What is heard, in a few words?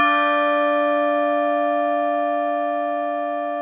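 bell sounds double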